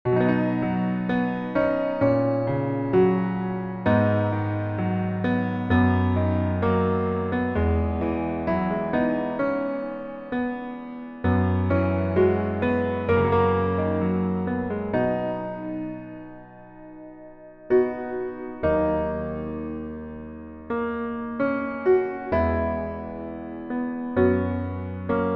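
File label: Piano version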